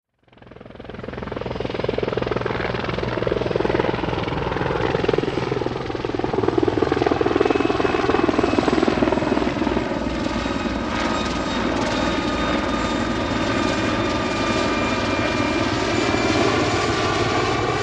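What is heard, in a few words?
CH53 Approach By Away Long, helicopter; HELICOPTER SIKORSKY CH-53 SUPER STALLION: EXT: Approach, by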